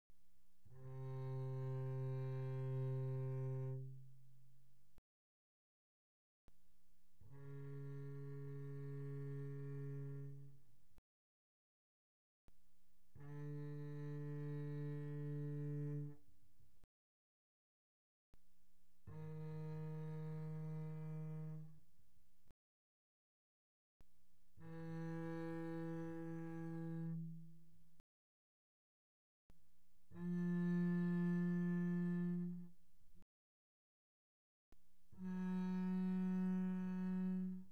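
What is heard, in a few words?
Bass arco pianissimo sul D C3 B3, doublebass; Strings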